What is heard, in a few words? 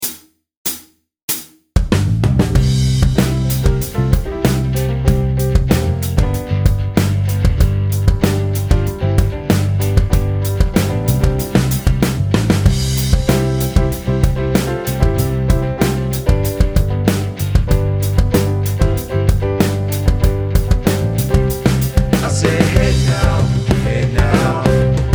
C C C G G G G C, chord progression jams